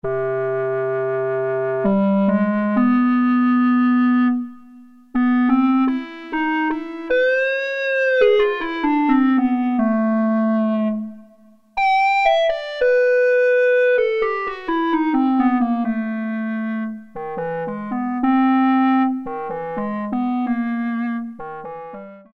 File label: Clarinet, Roland MKS-50; Factory examples